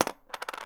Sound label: Dropping A Coin On My Nightstand